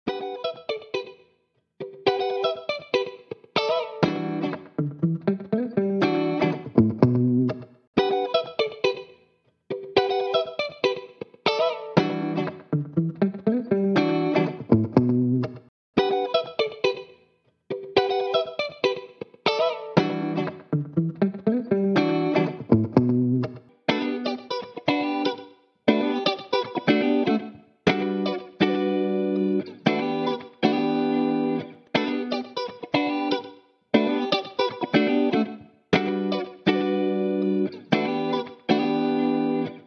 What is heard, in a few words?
Blues Guitar Loop